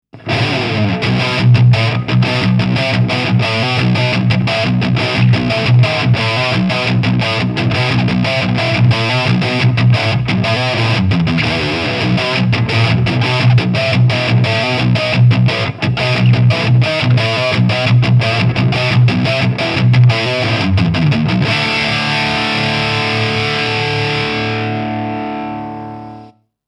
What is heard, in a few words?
Chapman Guitars ML3A Baritone Lead Bridge Pickup